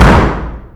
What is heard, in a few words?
Bang Door